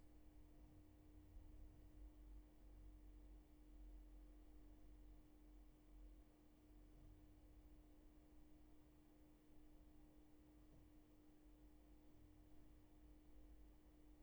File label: roomtone